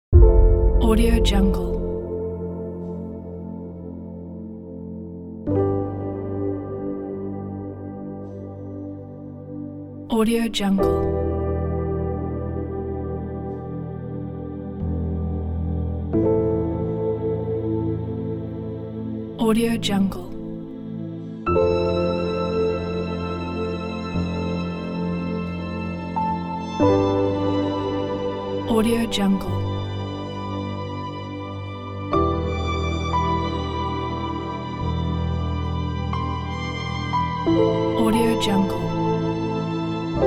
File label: fortepiano